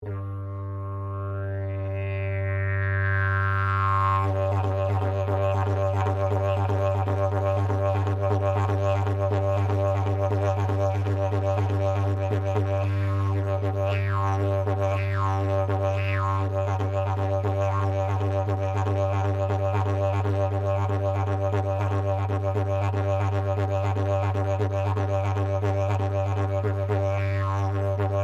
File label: Didgeridoo 3 Part Slide Didge Piece, Plastic telescopic didgeridoo; Plastic telescopic didgeridoo, modern design; Miscellaneous Musical Sound Effects